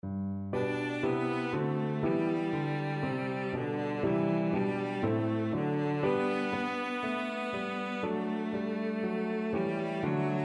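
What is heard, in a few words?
violoncello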